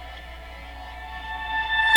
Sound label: Farfisa organ